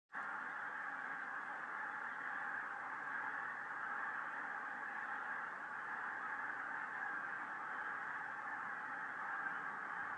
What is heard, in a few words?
my noisy neighbours geese out in the bay partying